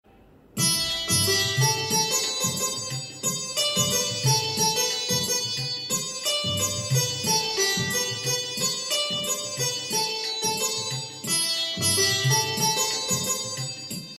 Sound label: devotional ringtone; Sai Baba